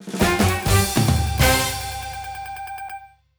Win Large; jack hammer 2

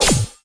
cash stack symbol appear; vegas night life

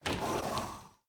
curtain Open Or Close; robin hood

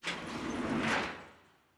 splash Screen Plate Open; cashomatic

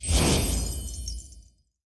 win Line Flash; fairyred

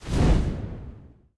mystery Sym Reveal

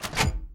reel Bounce; wildotron 3000

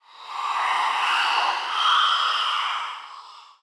heartbeat; superstriker

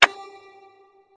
button down; hiddencoinsofegypt

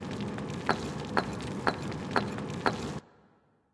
free Spin; secret of the stones

